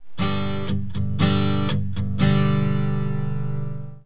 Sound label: p muting, guitar